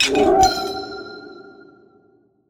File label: Ui Chest Item; Android; Portal Quest